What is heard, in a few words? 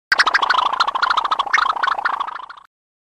melting sound